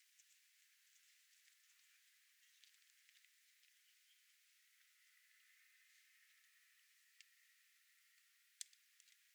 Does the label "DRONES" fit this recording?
Yes